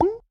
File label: Slime Vox Small; Going Under